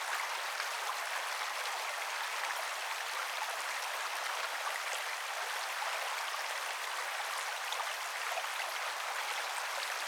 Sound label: ambient; World of Tanks